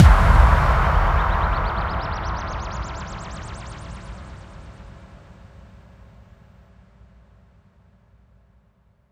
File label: FX Kicks; Vengeance - Essential Clubsounds Vol.4; Effects